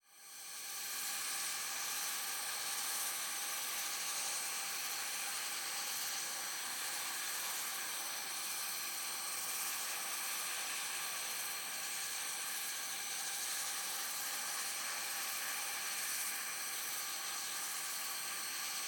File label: Animal Ambience Jungle Rain Forest Insects Creatures; animals; AdobeCC